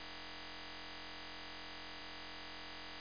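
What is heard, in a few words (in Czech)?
TICHO, silence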